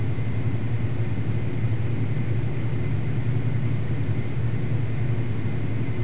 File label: lobby; valve